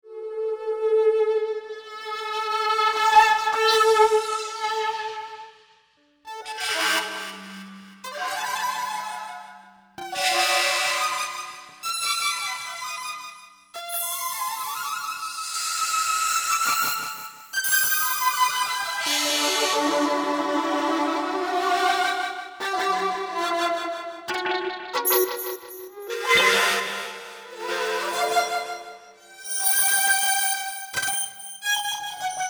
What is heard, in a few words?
hyperviolin